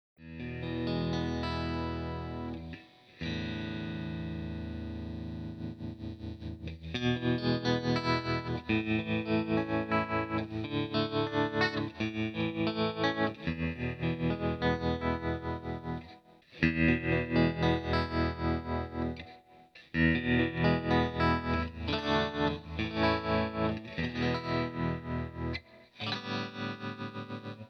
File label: Guitar Vibrato